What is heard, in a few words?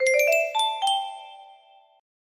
jingle, music box melody